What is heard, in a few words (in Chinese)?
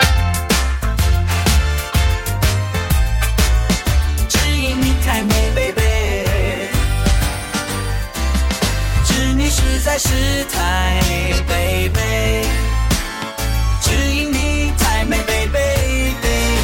布宜诺斯艾利斯探戈说唱：街头涂鸦艺人的韵脚，班多钮风琴切分节奏(Buenos Aires tango-rap graffiti artist's rhymes over bando; DiffRhythm